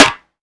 steel snare drum sample a sharp key, snare drum sample tuned to the A# note; snare drum samples